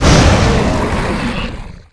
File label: Die